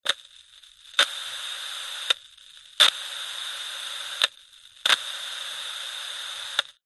radio receiver or other receiver click hiss crackle no signal